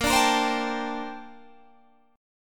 A sharp Major 13th, Guitar Standard; A#M13; strummed; JGuitar

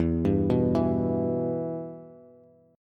E-Minor, Bass; arpeggiated; JGuitar

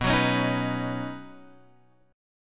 B Major Add 9th B down Guitar Open F, Badd9 chord; strummed; JGuitar

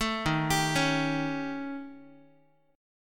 D sharp 7th Flat 5th, Guitar; D#7b5; arpeggiated; 12,11,10,11,x,x; 4A,4E,5A,5D,5Gsharp,6Csharp; chord shape

